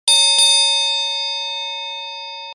Aluminium, Ringtones; Samsung SGH-F310 Serenata

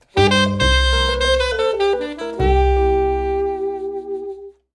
Sax; Huawei HarmonyOS 2.0

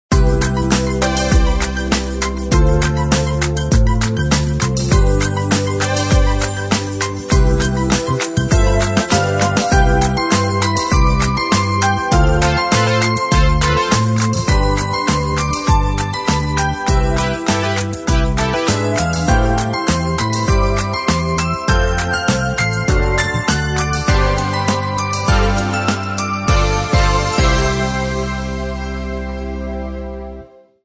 ringtong; Gionee V185

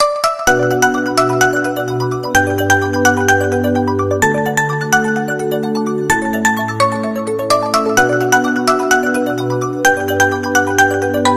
Go Beyond Traditional, ringtones

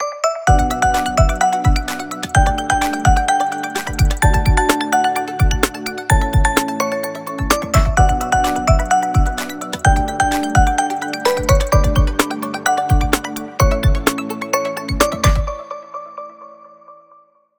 Electronic, ringtones; Honor; X7C

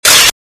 camera shutter

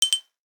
Chat alert, Nokia N79 digital tones

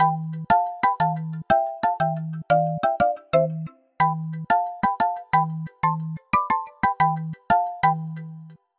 Skip Hop Jump, ringtone; Samsung Galaxy S25 Ultra; Fun

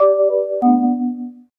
Email Sent; Escape P870; ui; LG

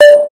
Video Record, ui; LG

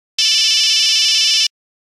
Low, Nokia Buzzer; Windows Phone apps

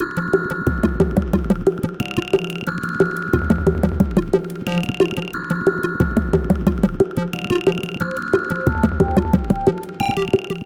Spaceship, ringtones; Motorola; Edge 70 Max China

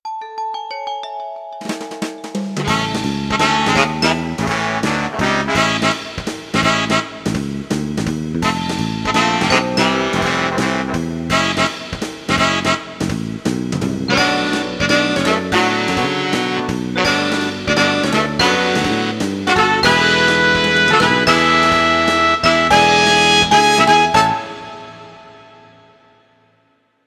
Jazz; Coolpad C558

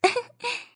Laughter, notifications; Infinix; XOS 15

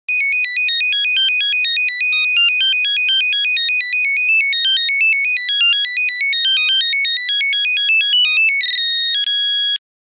Fuga, Nokia Buzzer; Windows Phone apps

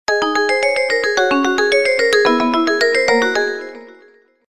Arpeggio, ringtones; Bluboo; S8

